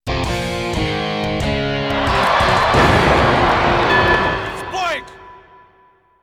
Logo; Shin Bakusou Dekotora Densetsu Tenkatou Icchojou Kessen; PS2

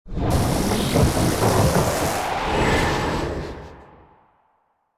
Absorb

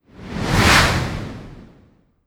Ice Shard Wind

